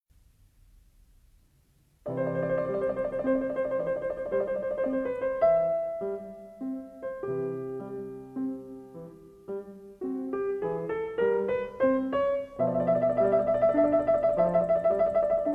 Piano sonata nr. 16, II d.; Beethoven L.

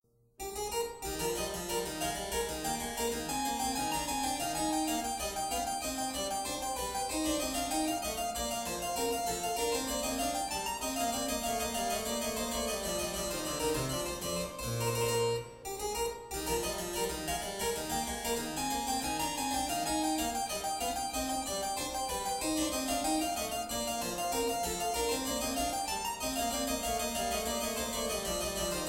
Bourree in E; Franse Suite 6 in E BWV 817

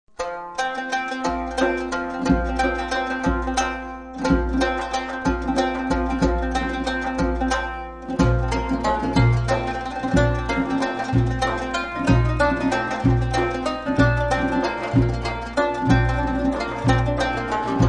qanun; Persai

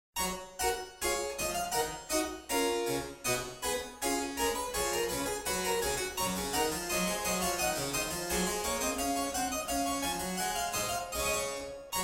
Gavotte in G; Franse Suite 5 in G BWV 816

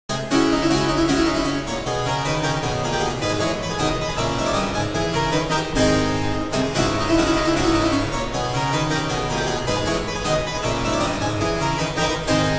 Praeludium & Fuga, Ouveture burlesque in D minor; Georg Philipp Telemann

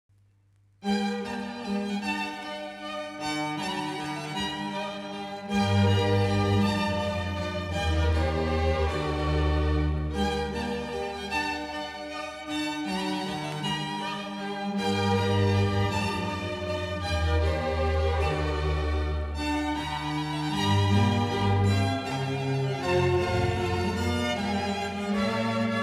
Concerto grosso g-moll; A. Corelli

